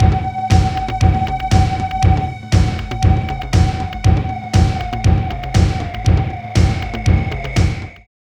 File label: LOOP, Tekno Industrial; East West